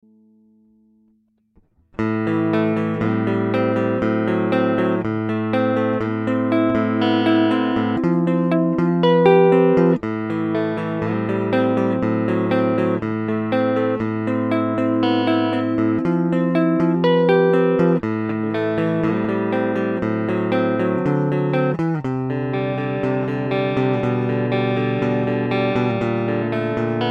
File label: Sterling clean